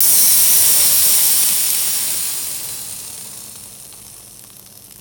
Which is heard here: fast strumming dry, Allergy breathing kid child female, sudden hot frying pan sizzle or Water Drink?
sudden hot frying pan sizzle